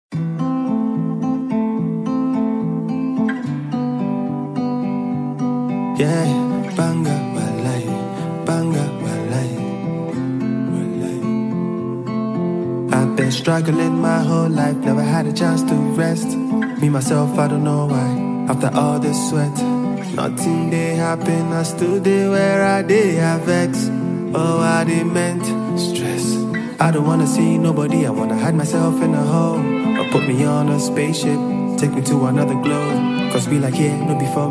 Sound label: Shaker; Daben